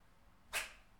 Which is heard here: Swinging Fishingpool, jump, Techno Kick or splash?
Swinging Fishingpool